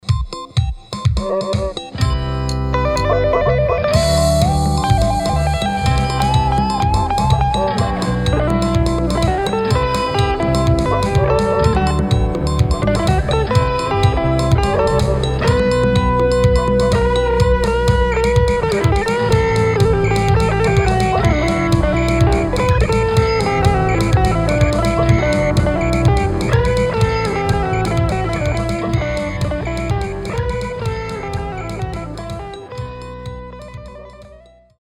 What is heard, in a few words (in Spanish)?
Samba Gitana